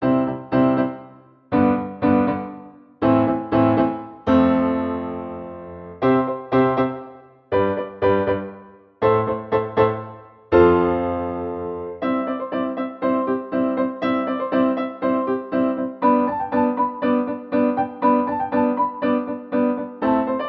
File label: I V vi IV Piano C